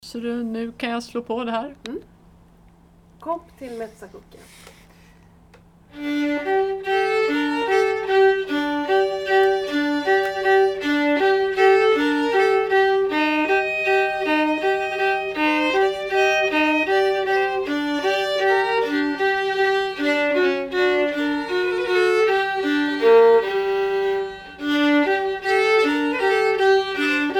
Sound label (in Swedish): stämma; Metsäkukkia